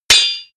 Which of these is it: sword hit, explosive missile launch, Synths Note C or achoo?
sword hit